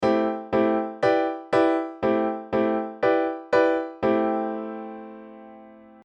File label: Aeolian prog; Modal Harmony: An In-Depth How-To Guide